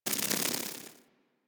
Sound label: Critter Scatter Clicky; Warframe; Infested Eris; Ambience; Outdoor